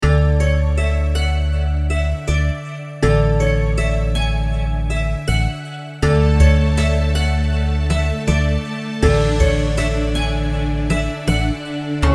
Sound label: Indian Pleasant Music